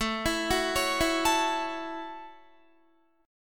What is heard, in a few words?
A 6th, Guitar, chord; A6 arpeggiated